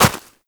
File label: Operator Run Snow, Footsteps; Warframe